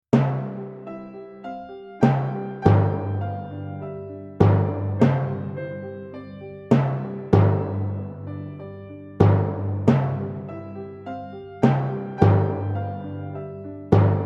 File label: Timpani